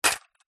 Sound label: foley coin down in metal container